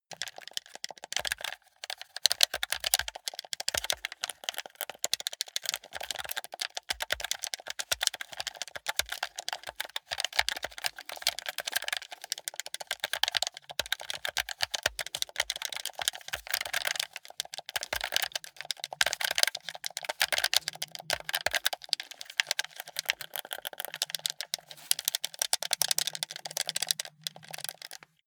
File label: console controller play sequence hectical long